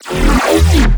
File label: Neuro, bass